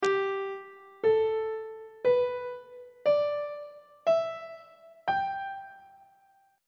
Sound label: g major pentatonic scale on treble clef